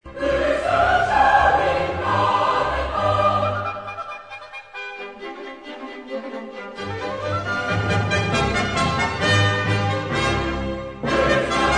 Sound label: Cantata, chorus; Bach; BWV63; Hochster schau in Gnade an